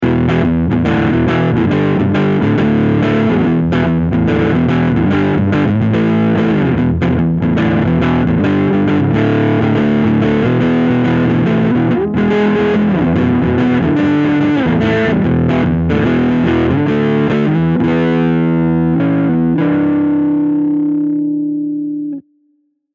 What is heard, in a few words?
Fuzz